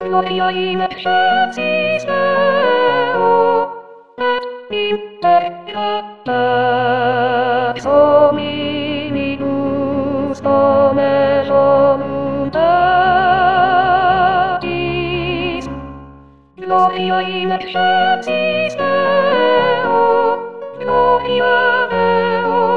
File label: gloria sop